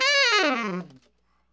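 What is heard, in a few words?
tenor long fall D5, saxophones; Greytsounds - Philip Wolfe David Crozier's Saxophones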